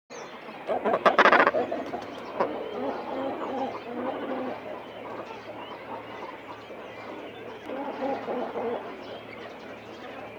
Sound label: Cormorant